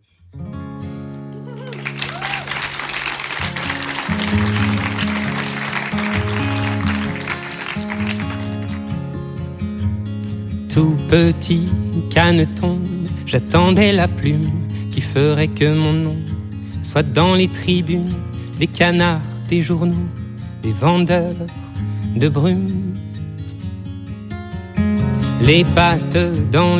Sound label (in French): guitare voix Live; Live des 10 ans